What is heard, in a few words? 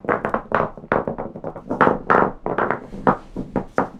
Roll Lp